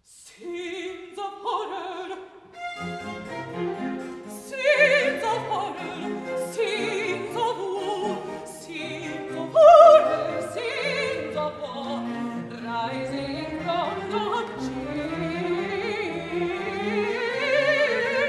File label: Air; Jephtha; Scenes of horror